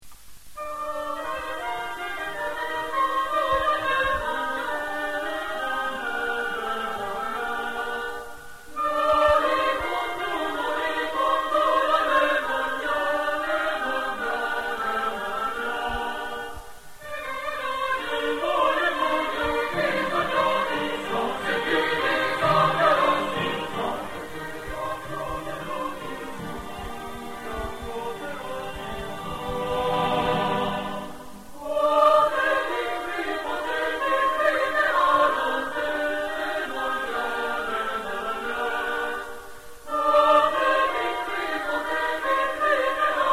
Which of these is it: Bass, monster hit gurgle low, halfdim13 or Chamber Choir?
Chamber Choir